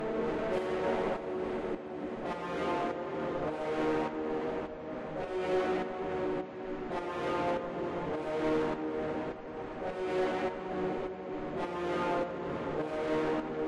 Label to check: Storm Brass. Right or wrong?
Right